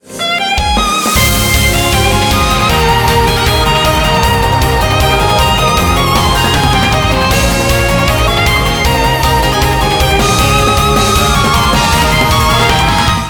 BGM xfade; Invaxion

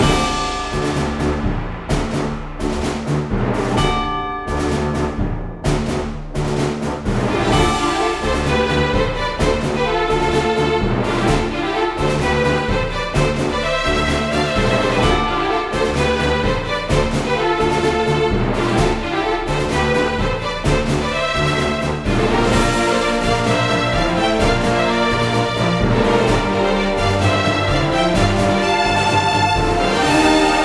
Strategy Loop; Murasama; Android

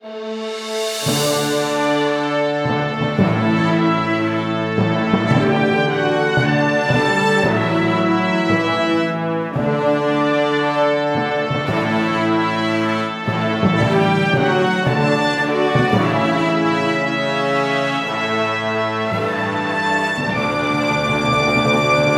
Result Screen

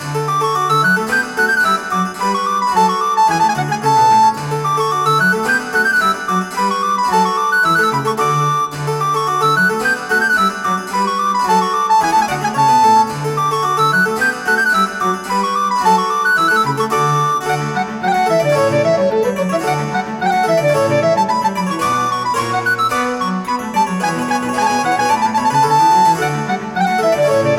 Tea Party Loop; Murasama; Android

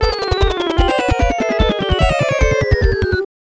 Unused Jingle

